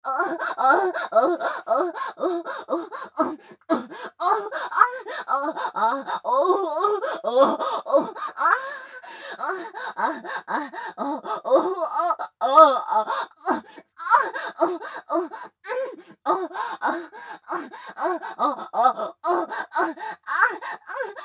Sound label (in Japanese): 喘ぎ声 ふつう